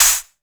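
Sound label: hi-hat open; REMIX 5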